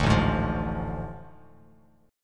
A Major 7th Suspended 4th Sharp 5th, Bass Standard; AM7sus4#5; strummed; JGuitar